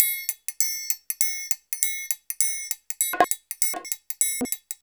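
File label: TRIAN, triangle, Modern Percussion Loops; Soundscan vol.56; AKAI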